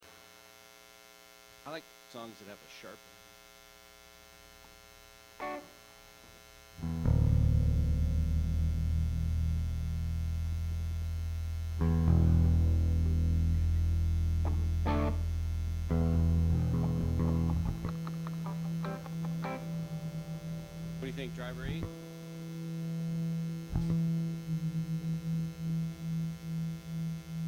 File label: Buzz and Feedback Mix